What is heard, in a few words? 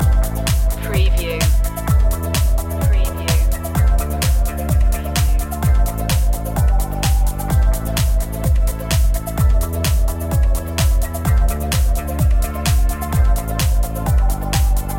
electro house loop; Melodic electronica music and synthesizer sounds containing flowing fresh melodies.